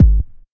bassy kick sample g sharp key, steel kick drum sound tuned to the G# note